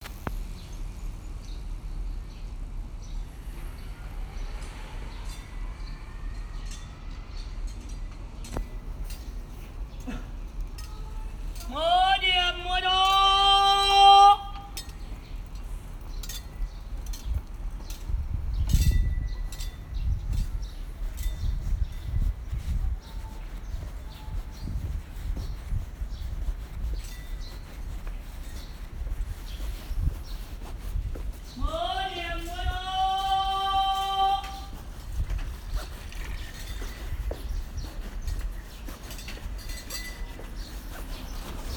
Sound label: street call